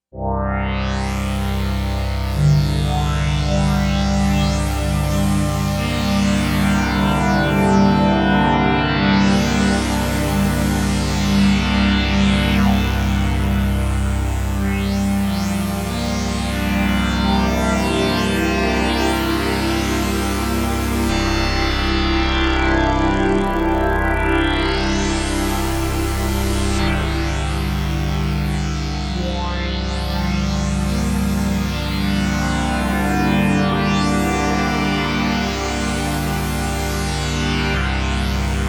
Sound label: String Patch Modified with Filter Sweep